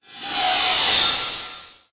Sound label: science fiction machine swell